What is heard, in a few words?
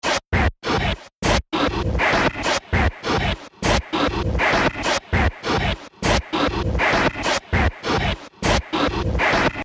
The Steam Machine Loop; Machinery Sound Effects; Looping Sound Effect; Fantasy, Horror And Sci-Fi Games